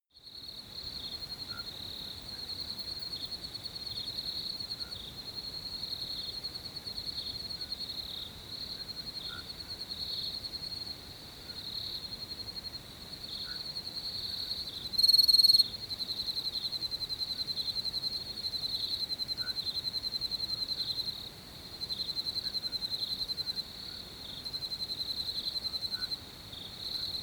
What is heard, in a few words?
water hole night